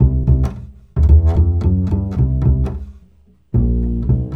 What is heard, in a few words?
Acoustic Bass